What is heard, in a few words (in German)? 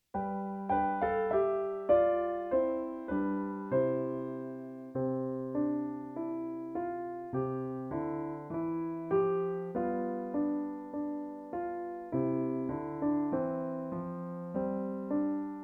Leiterreiter in C